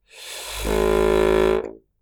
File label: no buzz deflected buzz